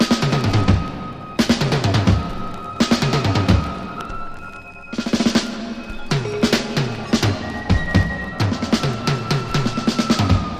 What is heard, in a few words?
psychedelic percussion